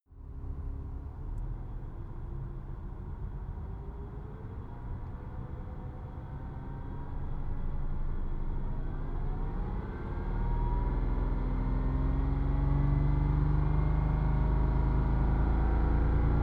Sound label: sports car porsche boxster accelerate slow interior; verkehr transport; autos